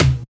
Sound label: Percussions